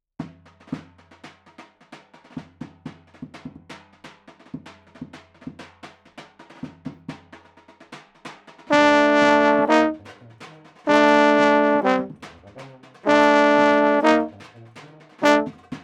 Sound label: euphoniums